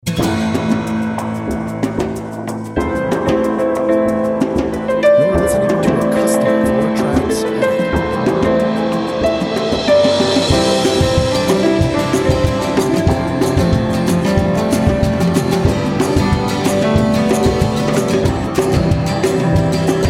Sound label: resonate